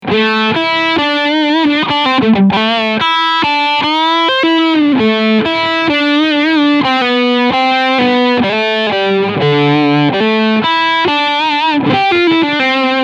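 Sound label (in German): PRS SE Hollowbody Leadsound Steg Pickup, E-Gitarre; FR Fire Red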